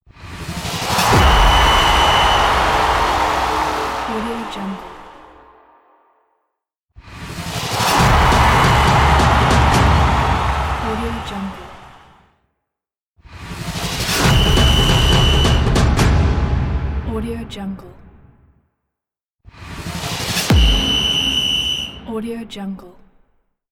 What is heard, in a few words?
football soccer logo